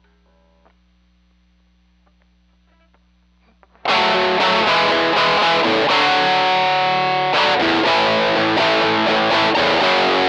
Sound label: Guitar